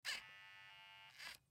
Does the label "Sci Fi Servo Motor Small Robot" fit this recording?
Yes